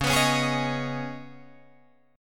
Db 7th Suspended 2nd, Guitar, Drop D

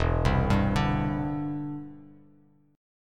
E Minor 7th Sharp 5th, down, Bass Standard; arpeggiated

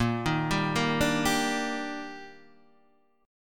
Eb Major 7th Bb

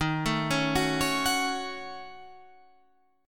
Eb Minor 7th Flat 5th

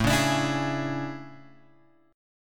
A Major 11th, guitar; AM11 Chord {5 4 X 7 5 4} strummed

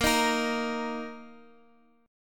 D sharp 5th

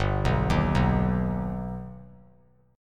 G Augmented G, chord; G+ arpeggiated; JGuitar

